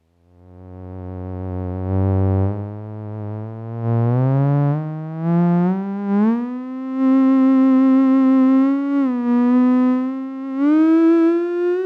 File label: theremin; dual tube/valve; direct to sound card, no acoustic development other than your speakers